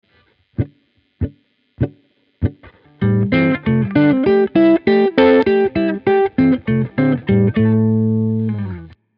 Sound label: blues, guitar